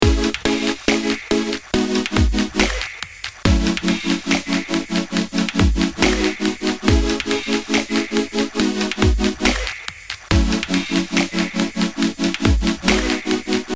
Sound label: serum